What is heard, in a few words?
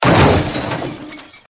crash car